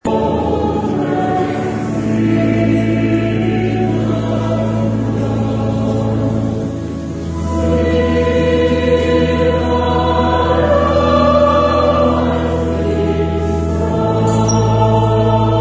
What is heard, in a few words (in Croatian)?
Tiha noc katedralni zbor